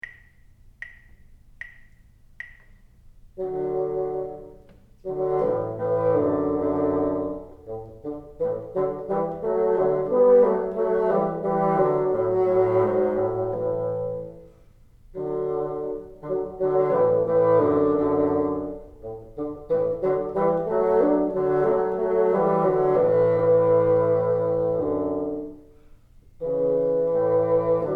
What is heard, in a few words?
together, bassoon; The University of Texas at Austin - Sarah & Ernest Butler School of Music